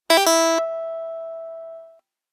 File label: ends with a harmonic; notification sounds